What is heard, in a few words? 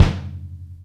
kick neutral; E-MU Formula 4000 Series Vol. 2; OrbitPercMaster